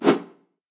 Equip; InventorySystem; HeroEditor4D

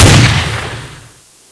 aug, GunSoundEffects; HeroEditor4D

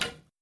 Shot, Bows; HeroEditor4D

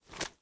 Weapon Foley; HQ FPS Weapons; Wieldables

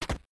Grass Footstep; tiles

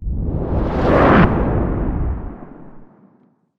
Switch Scene; SoftKitty; Master Character Creator

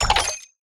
Use Item; SoftKitty; Inventory Engine

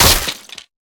BulletImpact Wood, Surface Effects; HQ FPS Weapons

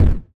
Hardfall; Spine Examples

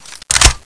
p228 clip in, Gun Sound Effects; HeroEditor4D

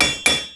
Do Craft; SoftKitty; Inventory Engine

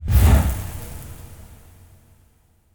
Load; SoftKitty; Master Character Creator

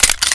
usp clip out, Gun Sound Effects; HeroEditor4D

